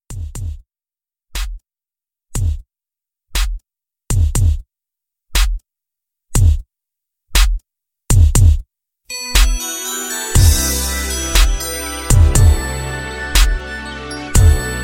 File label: 80s Pop Re Drum